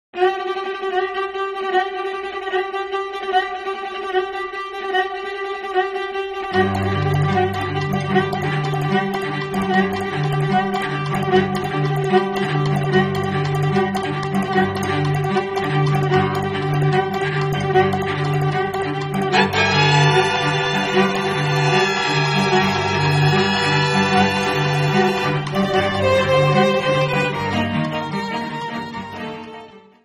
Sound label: stringquartet